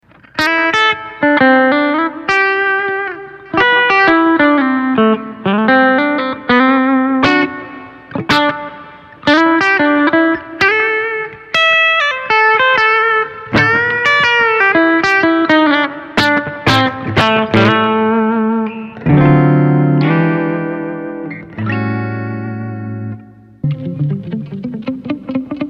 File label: Analog Delay